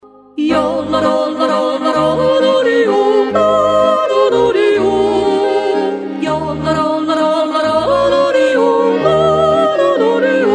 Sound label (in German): Jodel